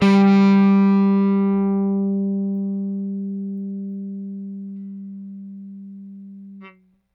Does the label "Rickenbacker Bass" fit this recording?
Yes